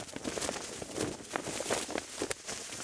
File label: tent flap; Star Wars Obi Wan; XBOX